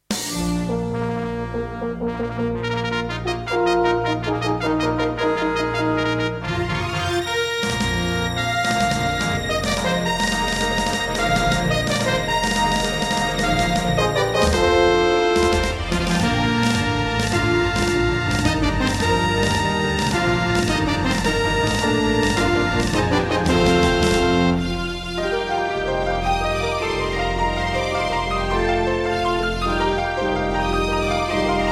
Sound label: Intro, Alesis NanoSynth; Dark Forces